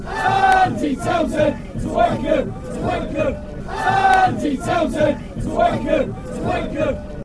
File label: fan chants; andy townsend